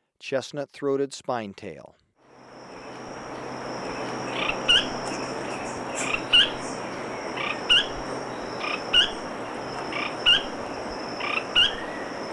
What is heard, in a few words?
Chestnut-throated Spinetail, Birds of Ecuador; S. America